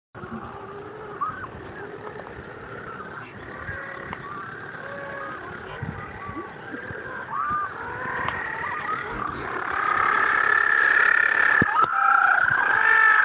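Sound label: penguin voice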